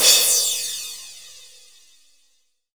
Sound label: Dance Drums crash L; Dance Mega Drums; Best Service; CRASHES